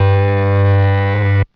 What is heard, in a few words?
wrong answer, String